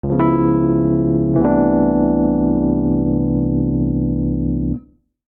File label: Cadence Descending Altered V7 - I C Major 90 bpm, Electric Keyboards; MIDI Clips; Ableton; Factory Packs